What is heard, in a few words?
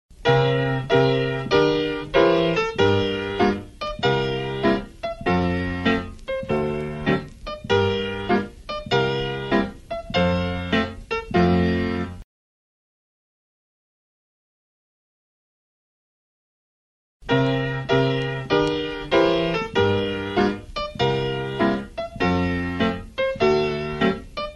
shuffles faster